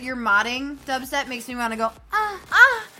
dubstep

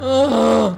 wookie

